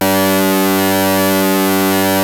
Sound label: ARP 2600 D3, synthesizer; Hollywood Edge - Giorgio Moroder Rare Synthesizer Collection